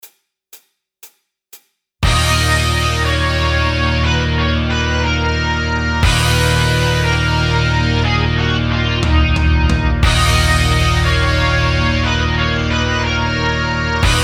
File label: synth